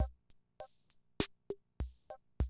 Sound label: CR78, DrumLoops